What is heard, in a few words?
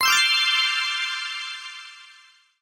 gambling win; In The Forest; Wazdan